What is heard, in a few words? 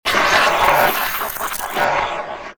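zombie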